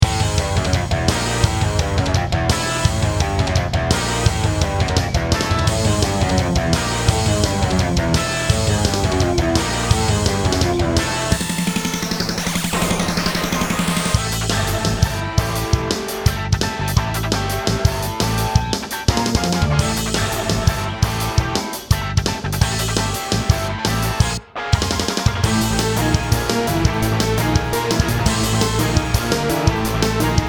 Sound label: skyscraper battle boss